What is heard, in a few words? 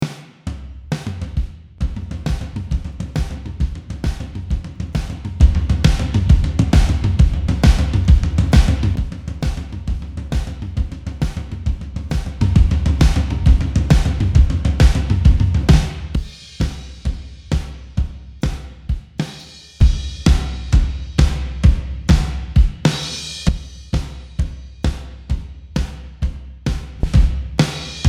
acoustic drums buss